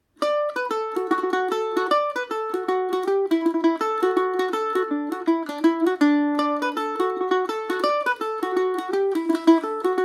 kilfrush dmaj, mandolin

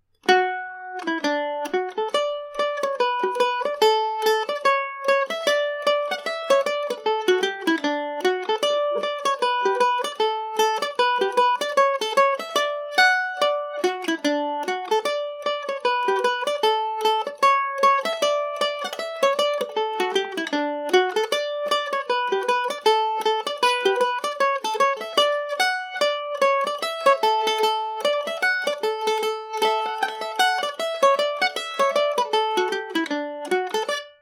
hornpipe, Irish mandolin; sheffield

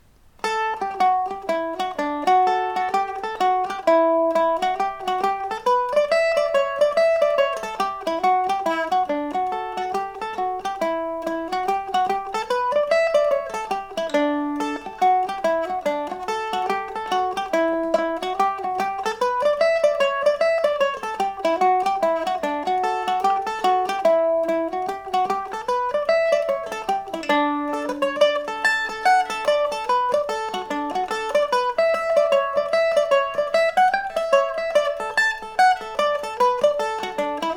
dance of the honeybees, mandolin

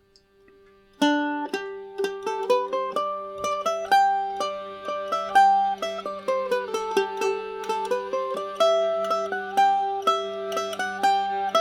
drones, Irish mandolin; flower among them all

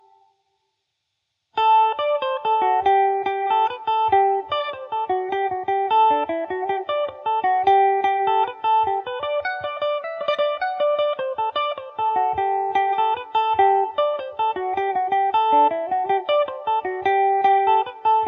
gmaj, mandolin

